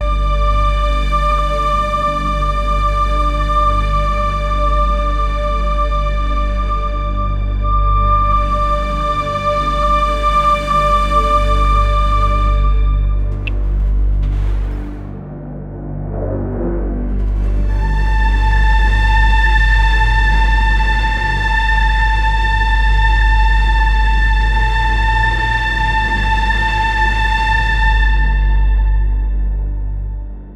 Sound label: nepali movie cinematic tr